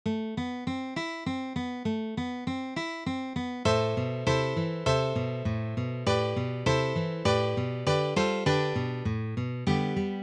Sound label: Spanish Dance Quartet; Spanish Dance No 2